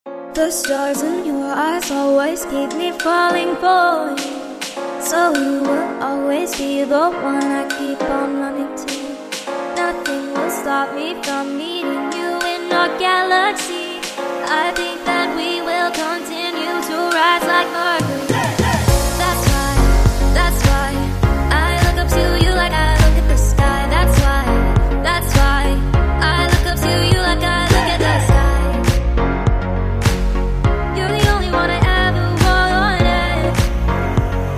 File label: Sunny Party Vocal